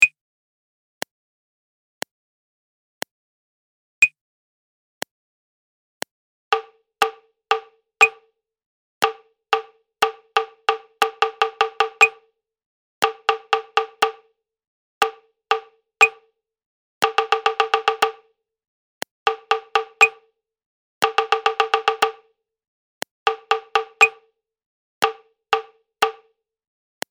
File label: Prepared Rhythm; Practice Sheet 6; advanced musicianship